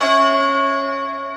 Key bell; Luxembourg Zwee

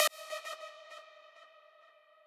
lead

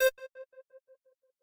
synth

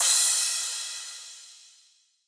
cym

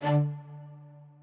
strings

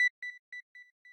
arp

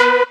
Mainlead Melody

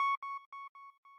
sq lead

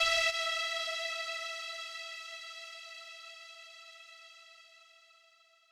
lead

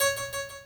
harp

